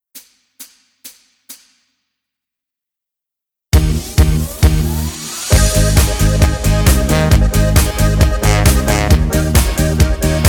ohne Lead AS Dur ohne Chor

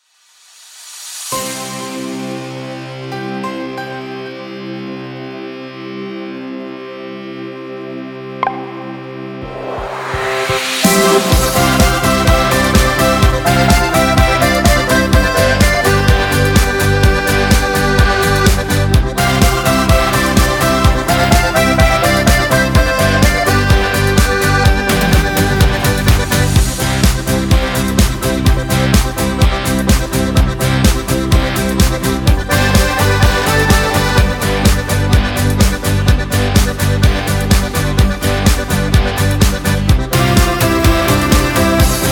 C-Dur Karaoke ohne Chor

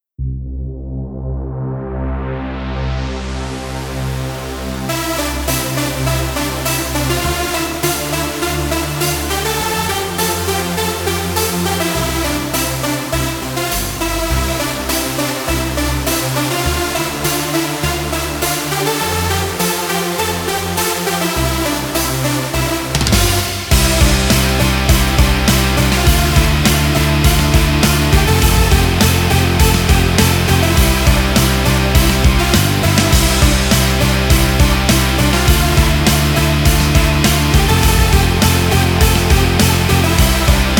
C DUR ohne Chor

